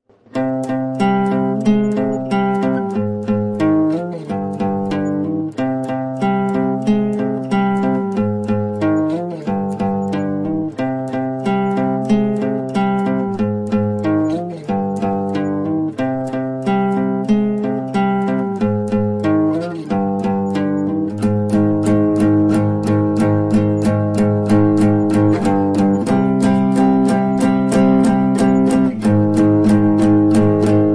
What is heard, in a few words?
guitar